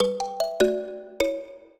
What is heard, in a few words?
trio, mbira; Mozart